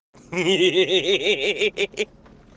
risada

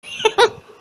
risadinha

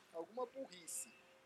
teclado

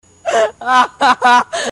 risada

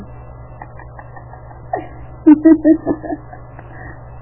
risada; uol

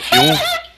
risada; Ted

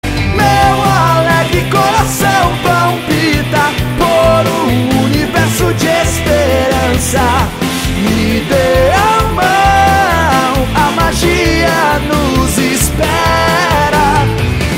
abertura; dragon ball gt

